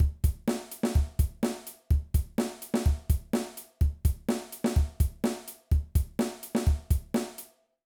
Rock Drum Beat

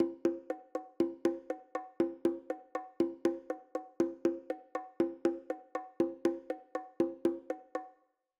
Bongo Pattern

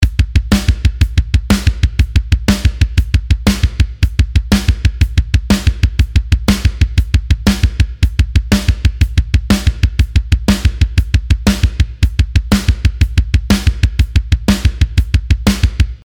Double Bass Beat, drum

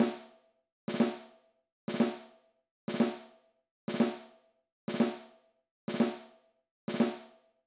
Snare Drum Drag Exercise

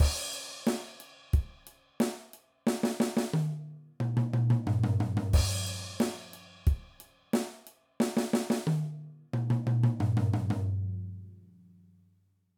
Fill, drum

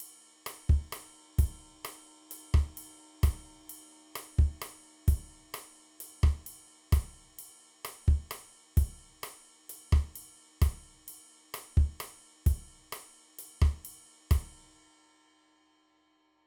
Salsa Beat

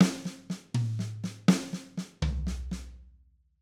Triplet Fill, drum

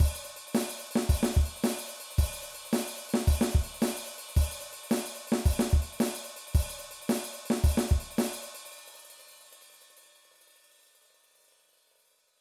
Punk Beat

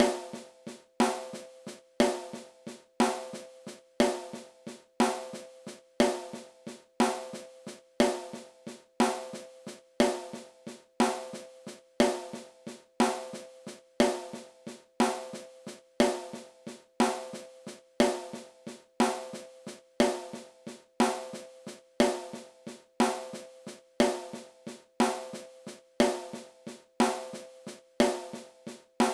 Moeller Exercise; Moeller Technique; drums

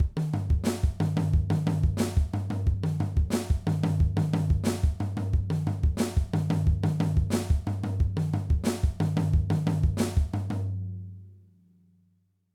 Intermediate Drum Beats

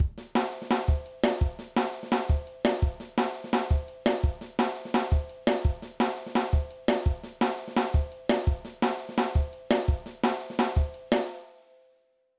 Groove Drum and Bass Drum Patterns

